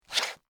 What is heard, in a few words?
High Heels Thick, Footsteps, High, Heels, Thick, Heel, Scrape, Wood, Surface; BlastWave